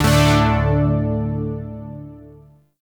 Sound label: Shawzin Chromatic Chord C; Warframe; Zariman Shawzin; Chords